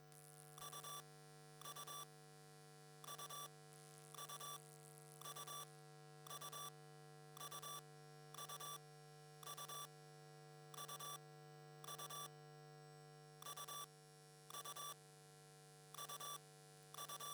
Electromagnetic Field, Electro; electric fields

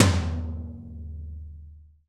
TOM, Real-Kit; 90 s Sample CDs; Sound & Vision - Gigapack I CD 1 (Roland)

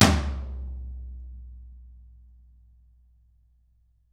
DW HIT, Drums; DW KIT1

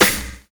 TRIP SNARE; BONUS SN2

